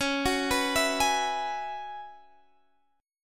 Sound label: F sharp 7th Suspended 2nd Suspended 4th